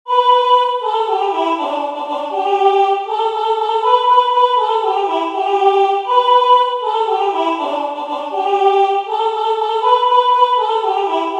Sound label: song paiute; The Ghost-dance religion and the Sioux outbreak of 1890